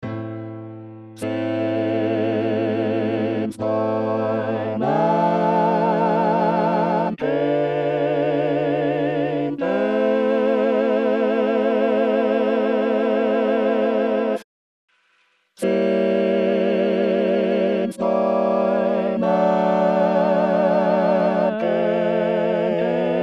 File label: Soprano; Since By Man Came Death